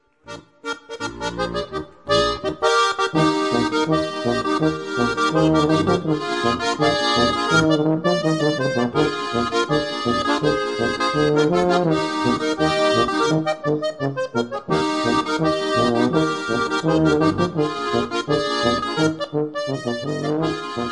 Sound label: polka; pinzgauer polka